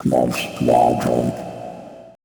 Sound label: FX VOCAL